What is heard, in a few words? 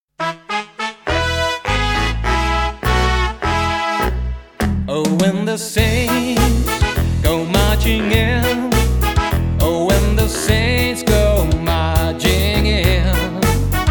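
Oh When The Saints, ukulele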